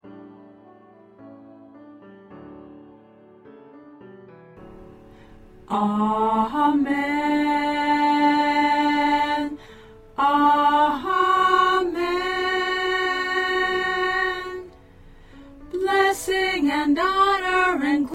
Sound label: Amen Alto